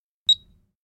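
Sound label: Fire Alarm Three High; hi-tech-various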